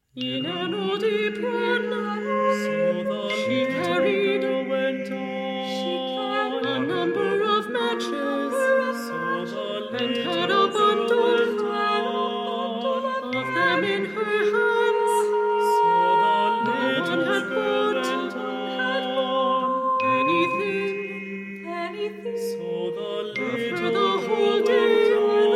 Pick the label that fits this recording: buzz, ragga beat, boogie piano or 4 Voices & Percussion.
4 Voices & Percussion